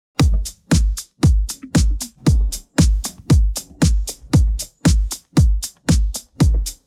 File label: pattern, drums